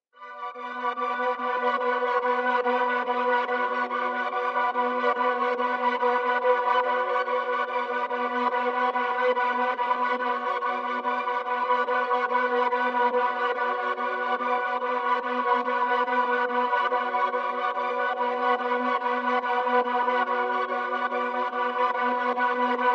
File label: 143 BPM F# Min Strings; Loop Stems; Melody; Cymatics; 2022 Melody USB Expansion; Haunted